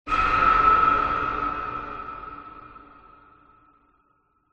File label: sound design cinematic hit hard fear screaming long reverb; zapsplat